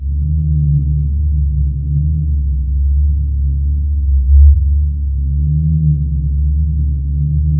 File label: very low, very low drones; Zero-G Ambient 1